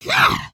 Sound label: Run, creatures organic